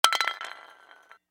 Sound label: spray can drop concrete, graffiti spray can drop on concrete